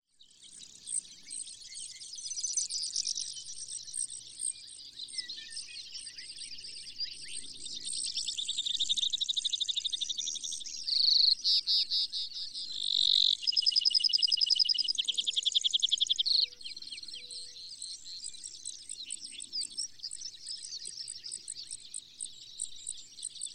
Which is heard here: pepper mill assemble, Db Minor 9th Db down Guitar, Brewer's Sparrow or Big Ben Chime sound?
Brewer's Sparrow